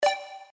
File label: bet increase; ThreeHeadedDragon